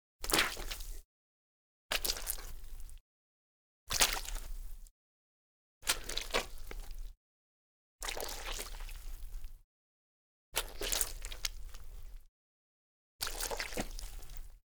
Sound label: Mud, Foley Footsteps; Sound Ideas